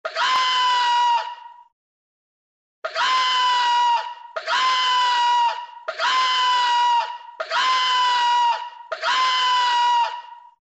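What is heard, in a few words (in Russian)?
krik petuha, rooster crowing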